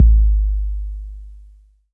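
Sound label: bass drum 808 Tape Decay E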